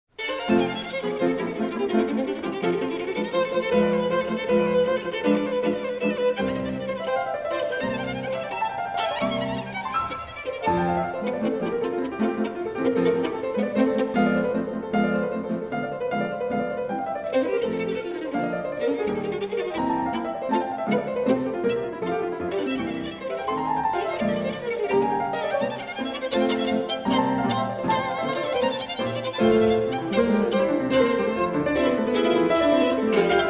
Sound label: Sonata in F major; Mendelssohn